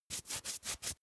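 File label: makeup stroke apply sequence fast, bathroom; haus-garten; badezimmer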